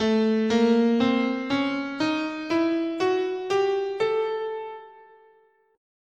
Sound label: A Diminished Half Whole, scale